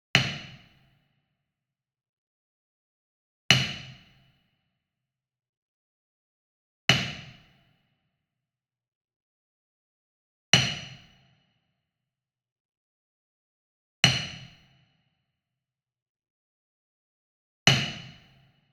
Wood Percussion Wood Block Traditional Resonant Hit Medium; boom library; Cinematic Strikes Construction Kit